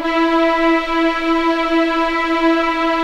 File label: E4 LEG P, VIOLINS; East West; The Ultimate String Collection